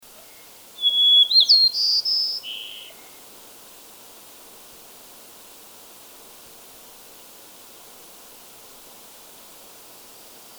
White crowned Sparrow Zonotrichia leucophrys gambelii